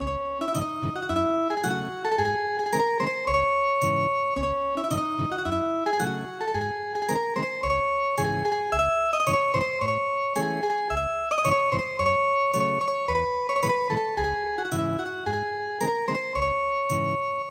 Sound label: Ethnic Guitar, traditional; The Frost